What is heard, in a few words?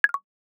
Record ended; Nokia; E52